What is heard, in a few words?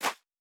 Footstep Sand Running; Ratel; Toria; Character Sounds